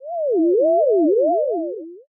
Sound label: ghost voice